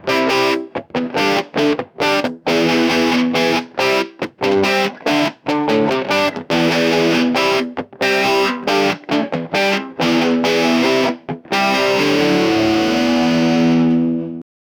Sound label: rock ballad guitar